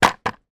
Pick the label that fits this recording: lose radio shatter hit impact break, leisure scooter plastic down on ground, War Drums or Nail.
leisure scooter plastic down on ground